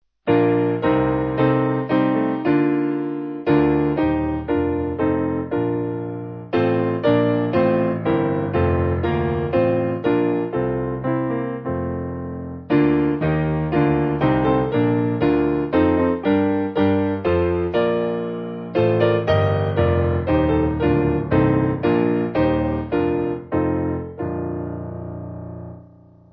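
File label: Piano, backing; All Ye That Pass By; Wareham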